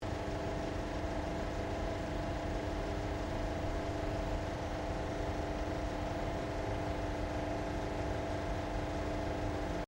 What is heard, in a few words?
PROJECTOR CINEMA 35MM OPERATING DISTANT LOOP, Modern Cinema projector; Projectors